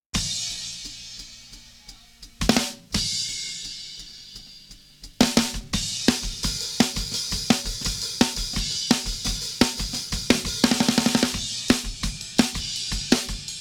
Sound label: Snare Drum; multitrack